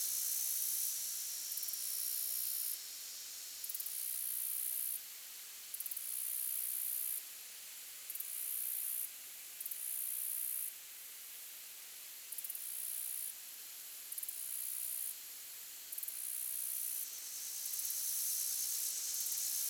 insects loop

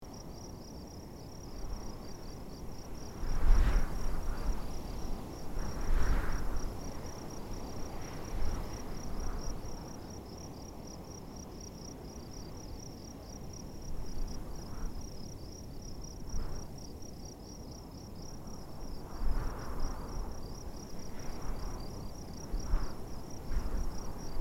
Ambient Audio Evening; Escape from Russia: Mobilization

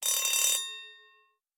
End Bell

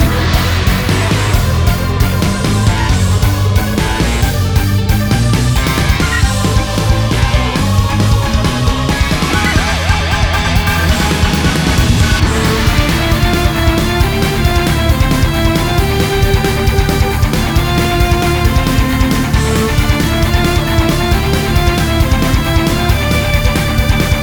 Boss Loop; winter memories windows gamerip 2024; Ariadne

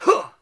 Quake jump; Cruelty Squad

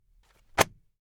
Newspaper Drop

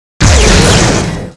Thunder; Conquer Online 2.0

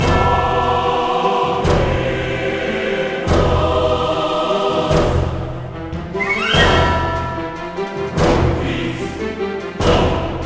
Oracle's Temple (Ambient); Eternal Legacy; Android

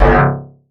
Ui Selection; Cruelty Squad

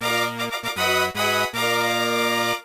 Jingle WIN WIN; Garaku Tale

